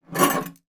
household food mixer whisk attachments pick up from table; zapsplat